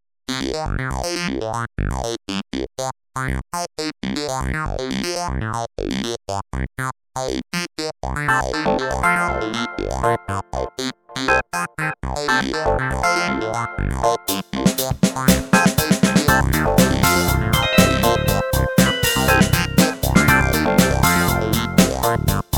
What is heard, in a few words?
Phaser; EVE; DKplus